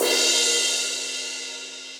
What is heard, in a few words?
Multirod Crash 14 Dry Acoustic, Cymbal; Drum Hits; Standard Hits; Session Drums Studio